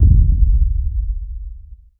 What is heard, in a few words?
low frequency cinematic i; distant